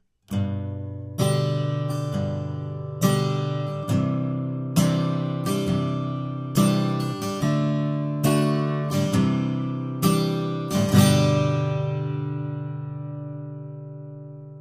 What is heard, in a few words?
Acc Guitar - C414 Card - Edge modelled; AKG C414; cardioid (kardioida); edge duo srovnavaci test